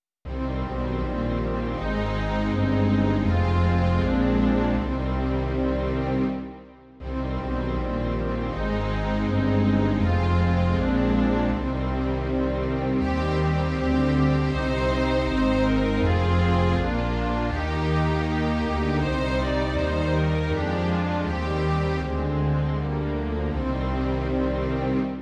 smyčcový orchestr; Osvoboď nás pravdou; Imaginativní verze

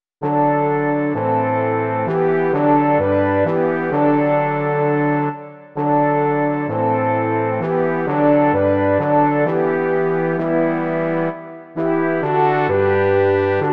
žesťové kvarteto; Ježíš, smrti Přemožitel; Imaginativní verze

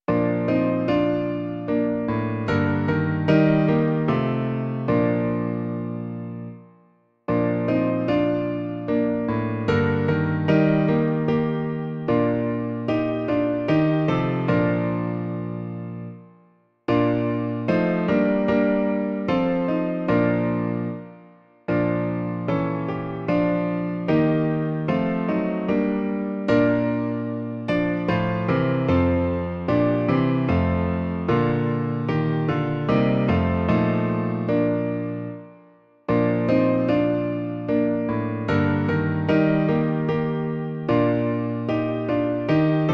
klavír; K horám patřím já vesele; Klavírní verze

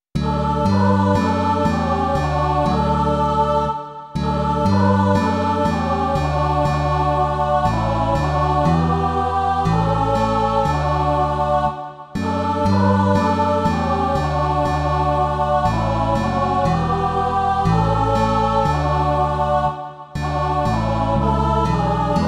kostelní schola; Rok starý v moře věkův; Imaginativní verze

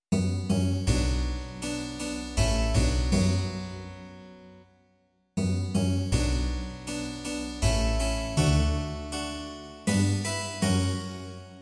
cembalo a kontrabas; Před tebou; Imaginativní verze

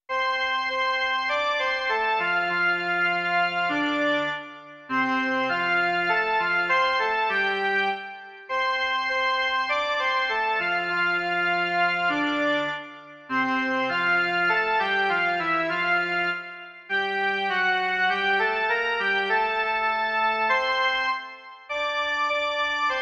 jen melodie; Ó jak věrný přítel Ježíš

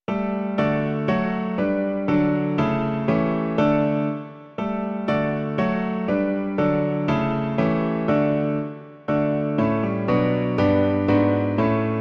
klavír; Ó Ježíši, ty v onu noc; Klavírní verze

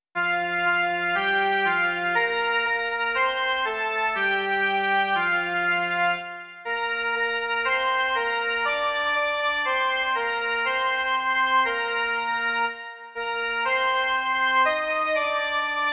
jen melodie; Pospěš, pospěš

